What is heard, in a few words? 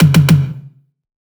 tom, Drum One Shots; rick; Slate; SD MIDNIGHT Sample Pack